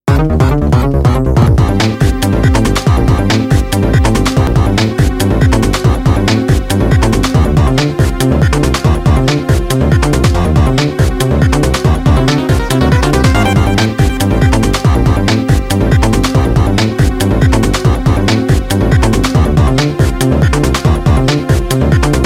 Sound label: anxiety count down; ghost trick